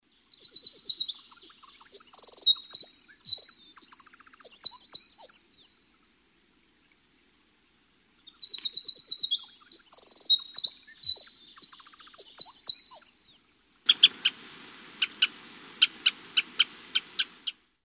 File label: Bird Songs Winter Wren